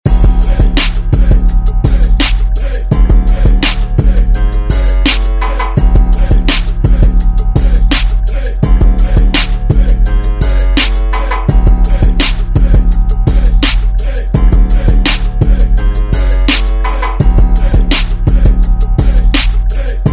Hiphop Redrum

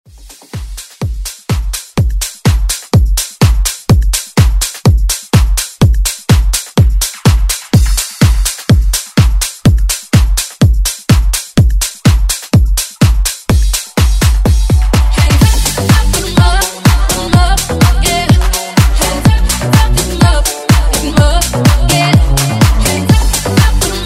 House Redrum

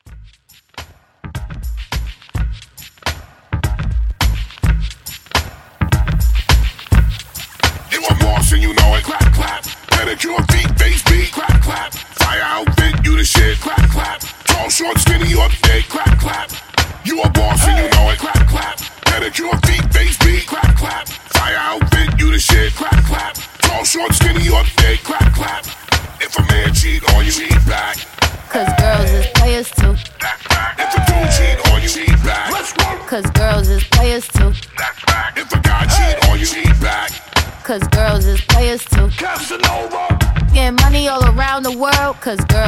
Hype 105

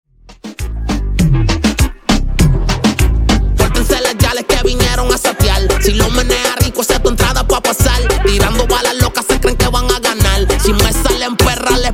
Intro Outro Transition 100 130 Bpm

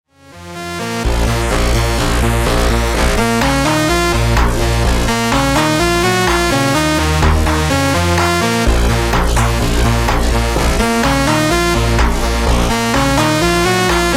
Disko Funk Remix 126 bpm

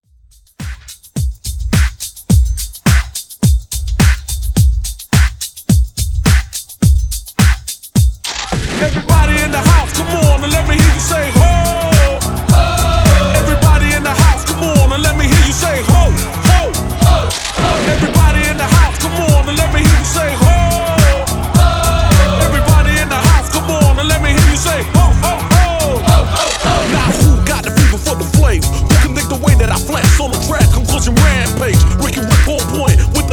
Redrum 106 Bpm

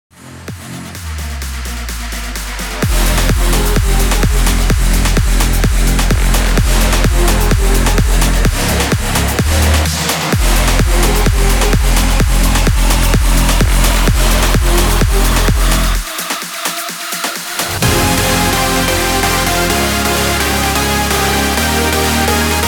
DJ CALLOUT 128 BPM

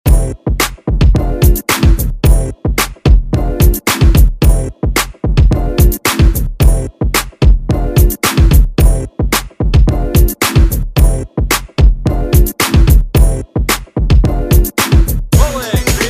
Top40 Redrum Intro Clean

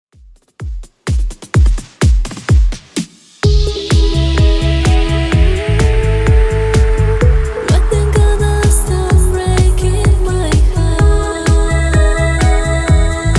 127bpm

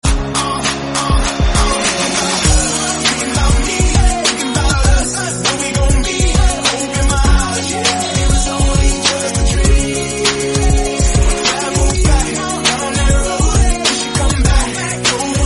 100bpm Db

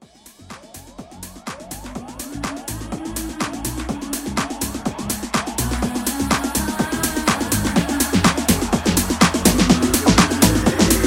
Future House Mashup 124

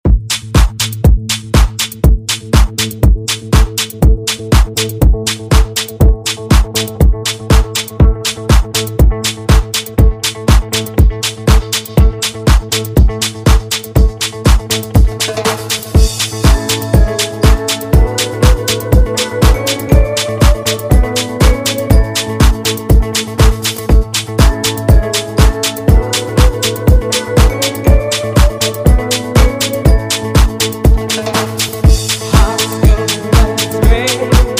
70s Dance Ext Redrum

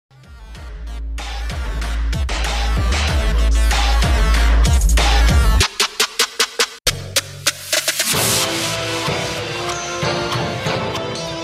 Trap EDM Hybrid 95 BPM

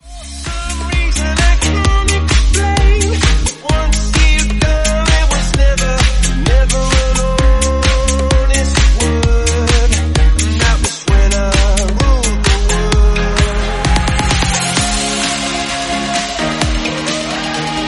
130bpm Ab

